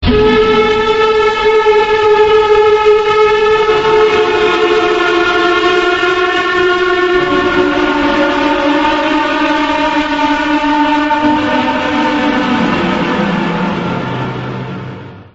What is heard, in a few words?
hell sirens